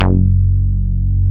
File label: Tekkno Bass; Best Service Gigapack 2